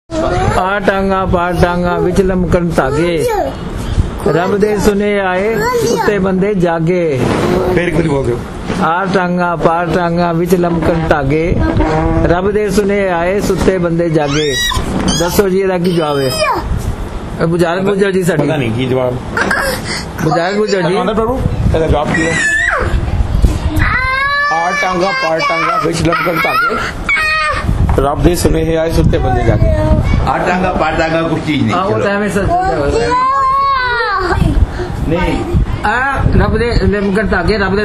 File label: Punjabi